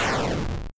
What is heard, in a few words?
boss Death